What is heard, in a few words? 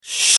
shhh; garrysmod; weapons